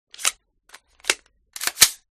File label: mkg45 reload, guns